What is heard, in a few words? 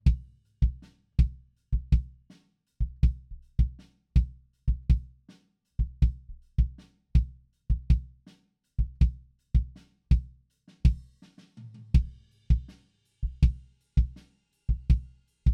Kick